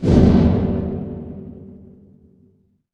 transition braam

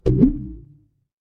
cartoon pop

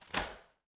door lock

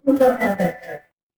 feedback fail

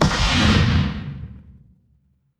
magic scifi laser

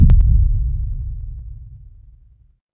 transition impact hit

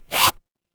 paper fabric zipper

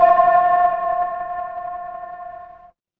instrument singing bowl

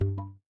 ui alert

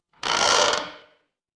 door creak

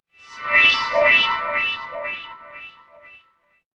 magic scifi teleport

